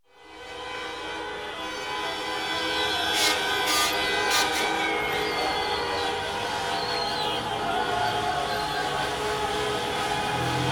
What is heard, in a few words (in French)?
polyphonie images marseille paysage; Ch Zanesi